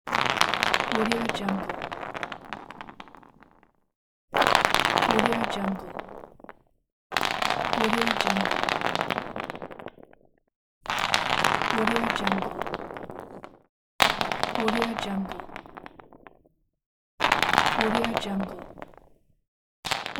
rapidly rolling object on the floor

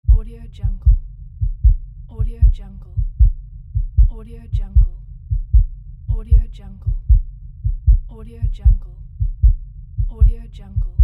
heartbeat

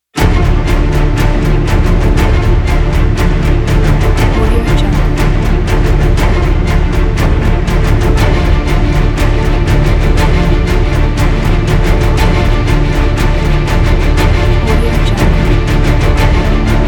epic

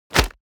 impact body combat plastic hit

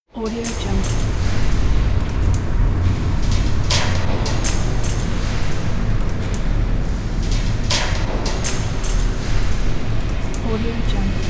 crawly ambiance

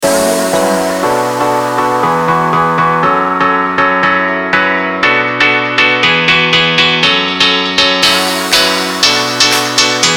deep house

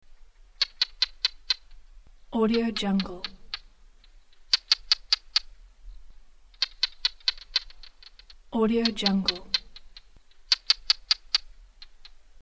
african barking geckos calling

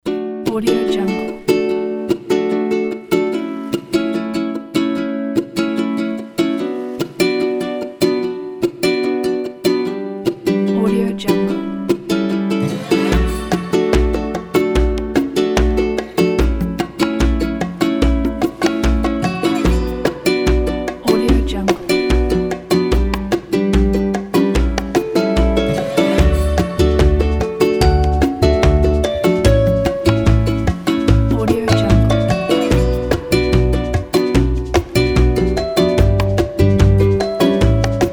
hawaiian vacation; csrproductions

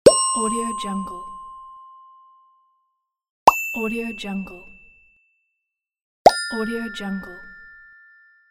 the correct answer

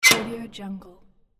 warehouse door latch

